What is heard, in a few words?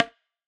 Perc, Dubstep; Percussion; Drums; One Shots; Cymatics; Dubstep Starter Pack